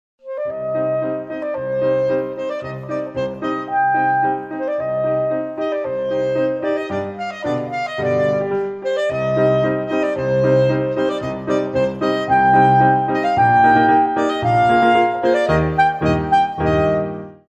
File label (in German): klarintte Klavier; hofmeister verlag